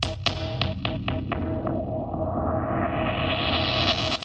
113 offbeat gate kicker phased, drum loops and breaks